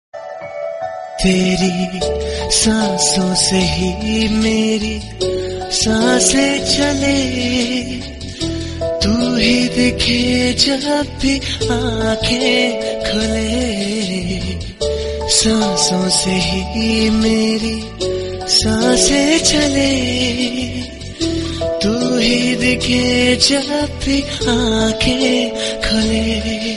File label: romantic hindi song tone ringtone